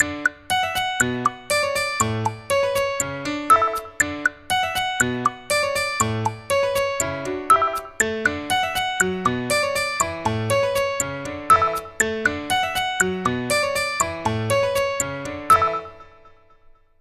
ringtones; LG G3 A

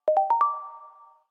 circle unlock, phonetones, ui; LG Optimus G F180K

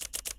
Camera Continuous Shooting; Alcatel 3 OT-5052D

